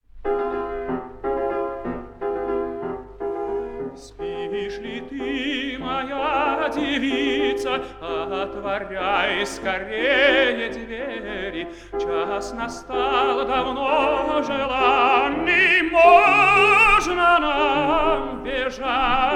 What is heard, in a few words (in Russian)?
Испанская песня; с М. Балакиревым